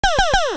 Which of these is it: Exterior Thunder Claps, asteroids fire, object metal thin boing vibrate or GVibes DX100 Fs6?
asteroids fire